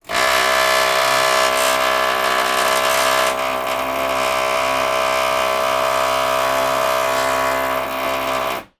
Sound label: industrial drilling wall floor below